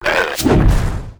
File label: attack; SNATCHERREX; Mob